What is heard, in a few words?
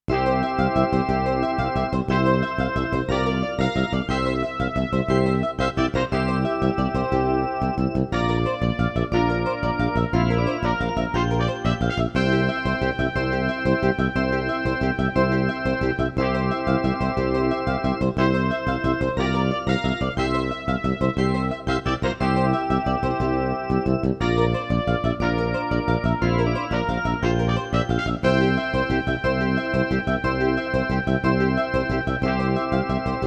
CM500 GM